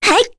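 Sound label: Vox Jump, Korean; Kirze; kingsraid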